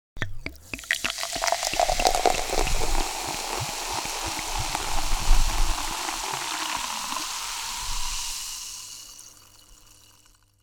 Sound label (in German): Apfelschorle; Haushalt